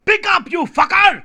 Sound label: pickup